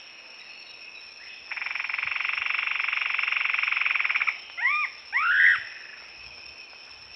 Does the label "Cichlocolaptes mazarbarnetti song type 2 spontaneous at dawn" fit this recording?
Yes